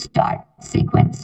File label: VOX VOX, Electro Vox